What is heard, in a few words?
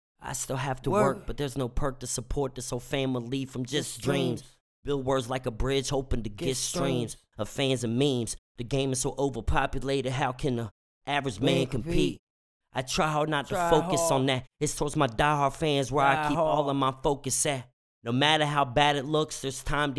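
hip hop, 163, G minor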